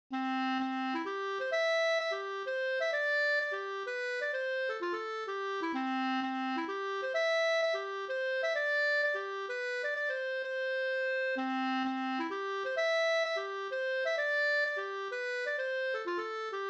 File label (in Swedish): polska bondpolska; Urpolskan; Viksta Up